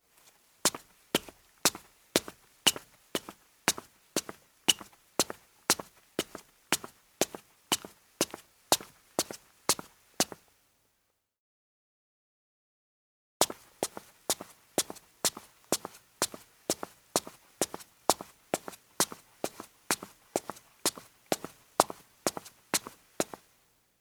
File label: Footsteps, Concrete Female Sneakers